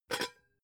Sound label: pot lid take off soft; objekte; topf